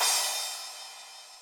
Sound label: crash, Zoom MRT-3B